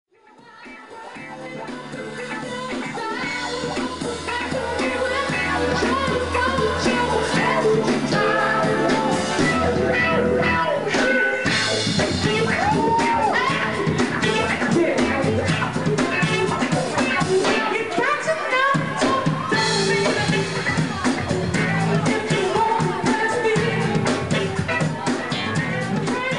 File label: kiss